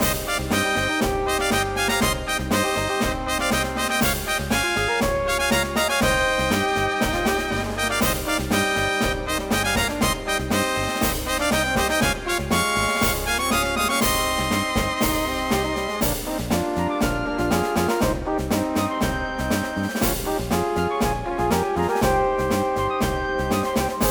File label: battle rival, music; Manamon Music; Manamon 1